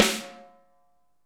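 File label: snare ambient; Best Service; Real Mega Drums VOL-1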